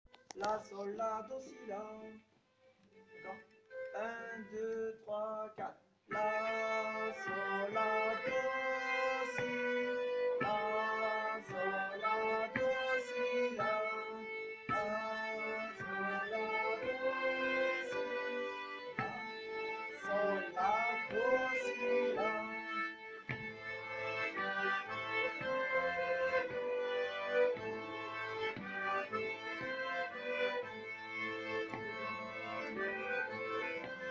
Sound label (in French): violons; voix 1 violon; Megastive; Cercle circassien